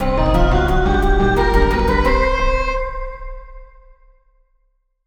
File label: level completed; Supr Jamp